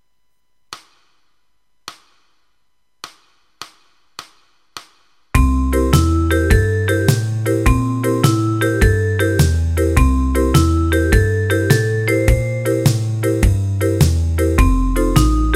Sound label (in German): Boogie Woogie 104 bpm; mein erster boogie woogie; einfache stuecke